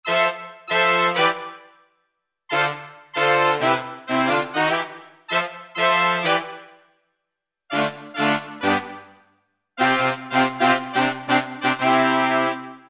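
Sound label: Brass Section, Roland SR-JV80-07 Super Sound Set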